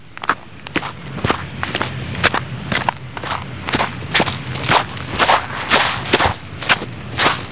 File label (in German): Schritt